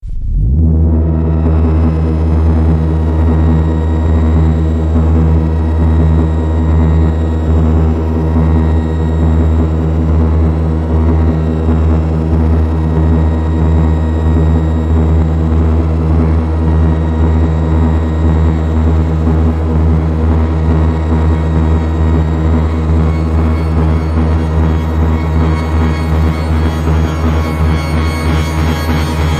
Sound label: Blipsoid Dark Dub